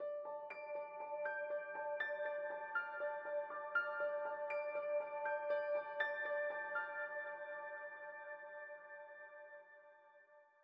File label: Piano